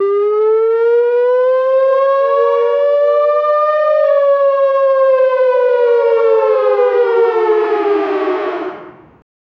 air raid siren; alert

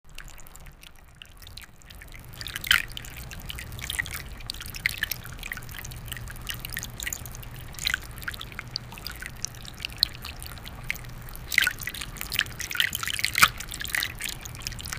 fish sound, aquatic

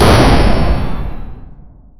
playful puzzle game, bells

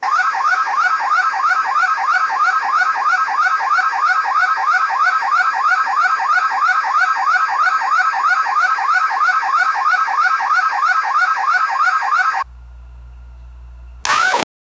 an old 1980s audio of, aviation

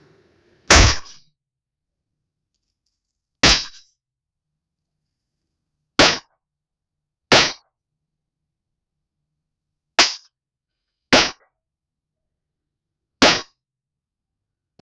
loud slapping sound, slap

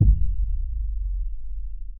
rapid minimal triple zoom; monochrome

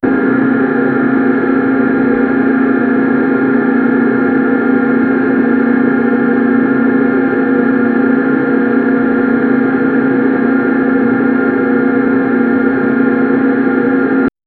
create an arcade game mac, christmas

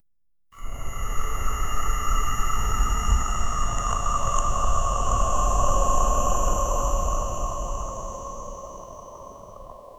soft fox whisper

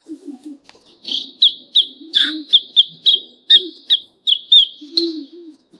scattered block clatter; baby sounds

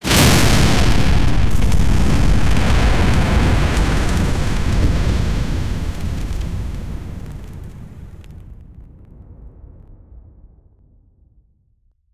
powerful firebreath, attack

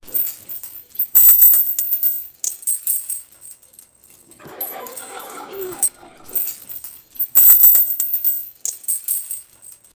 metal

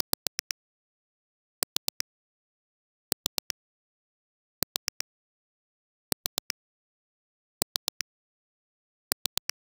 i need a metal mechanic, rhythmic

